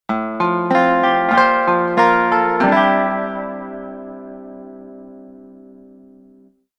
Music Menu event

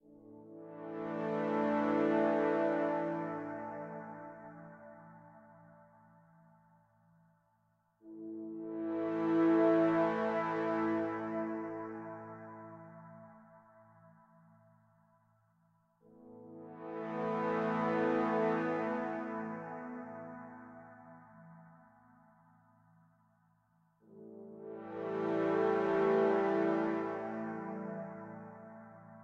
Level Music Section; Worms Rumble; PS4 PS5 Switch Windows Xbox One Xbox Series XS

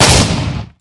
Skill Effect Canon; MilkChoco

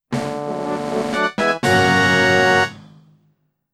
Battle Win Fanfare; legend of zelda the four swords adventures

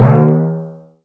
bounce; Birthday Party Bash; Wii; hot potato

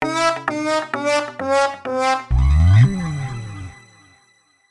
Gameover; my animal centre in australia ds gamerip 2007

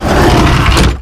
barn door close; birthday party bash; wii

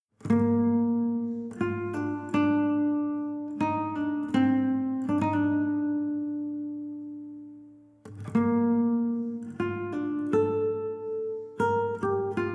Savannah Music

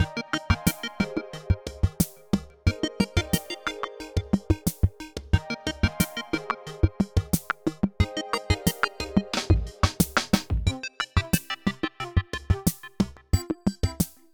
BGM Puzzle; Game Builder Garage

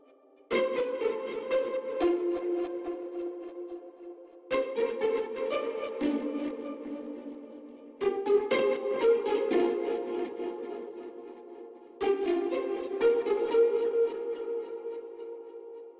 Pizzicato; Toki Tori 2

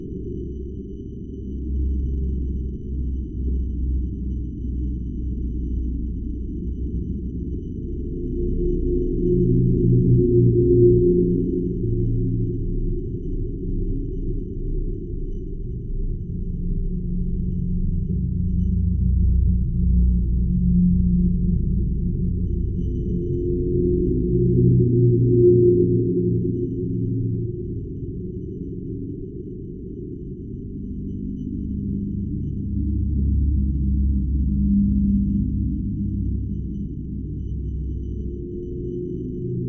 Ringing ambience; gamerip; the joy of creation halloween

